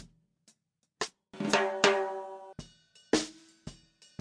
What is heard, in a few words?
snare mic; remixable collections; drums